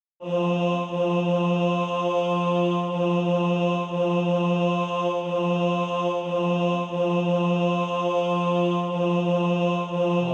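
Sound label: bass, SATB Practise Music; Christ Is Born Today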